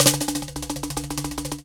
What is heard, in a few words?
drum, mixed drums, World Instruments; Best Service ProSamples vol.52 - World Instruments 2; AKAI